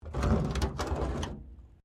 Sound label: WRECK METAL PART SCRAPE METAL HEAVY, Wrecked Cars; Wrecked Cars, impacts, crashes, scrapes; Objects